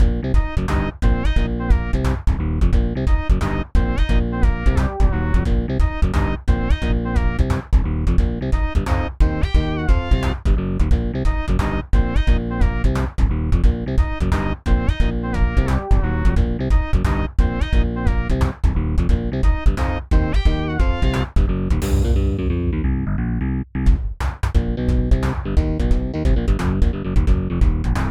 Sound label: Wacky Wobblings, Wacky song with slap bass and swing